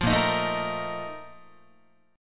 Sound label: Bb Major 7th Suspended 4th, Guitar; BbM7sus4 strummed